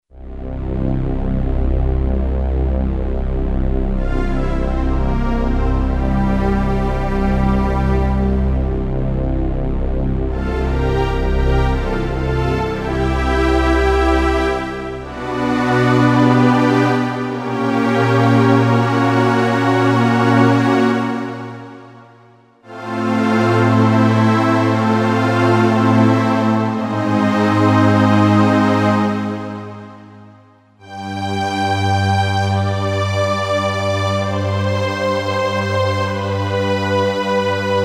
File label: VP330+SELINA, Akai SG01v